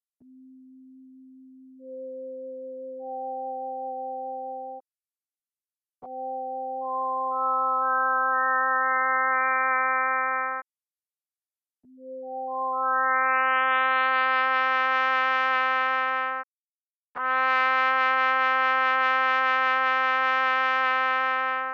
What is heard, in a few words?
trumpet buildup